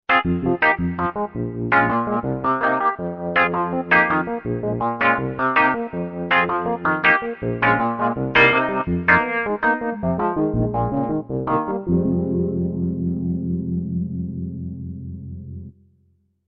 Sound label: Epiano